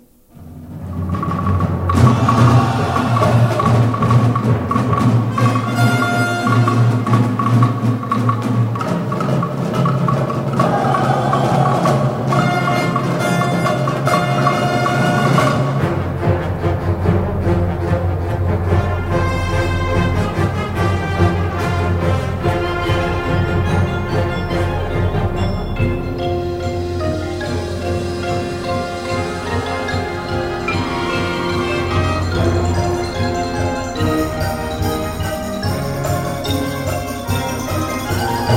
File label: Marimba Concerto; Naluwan